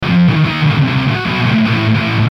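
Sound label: Musical Element, Drum Snare March; Blastwave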